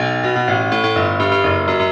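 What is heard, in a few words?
disco fever lick piano; Best Service ProSamples vol.48 - Disco Fever; AKAI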